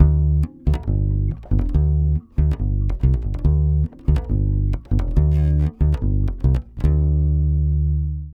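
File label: 140 BASS D minor 7, Brazil Bossa; USB Soundscan; AKAI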